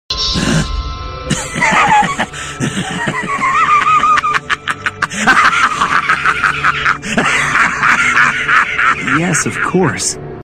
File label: Evil laugh; Ryuk